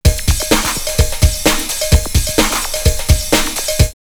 Rave drumloops 128; X-Static Goldmine; 90 s Sample CDs

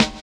HI RIDER; Spectrasonics; Metamorphosis

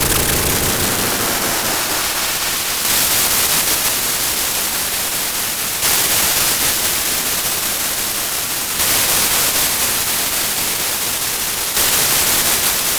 OTHERNOISE R, GRANULAR, Disjointed Textures; USB Soundscan vol.33; AKAI

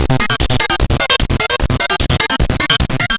Atonaloop, Industrial Analog; Analoops; Sonic Foundry (Sony Creative Software)

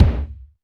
DM5 Kick, Alesis DM5 drum machine

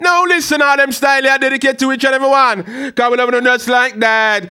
HAL RAGGAM, RAGGA-MUFF A maj

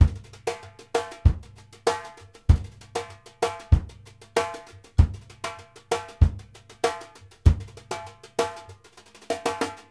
MORE STUFF, BRUSH 96; Sampleheads - New York City Drumworks VOL-1

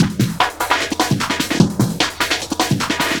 LOOP, Eurotech; Sounds Good

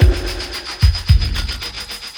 Ambi Groove

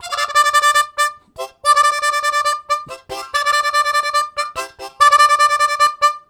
Staccato, Harmonica; Zero G

